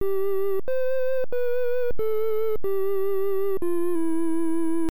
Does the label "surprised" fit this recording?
No